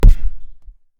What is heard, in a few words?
ball